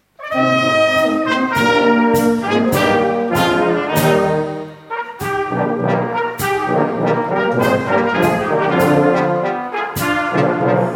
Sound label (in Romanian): Fanfara